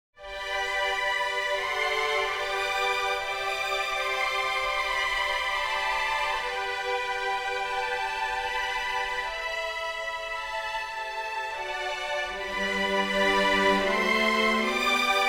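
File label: chamber music string quartet; Ode to Joy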